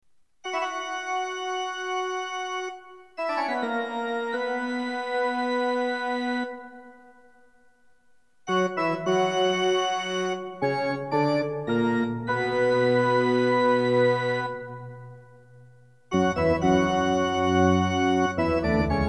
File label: Toccatta Fugue, Organ Music LX-500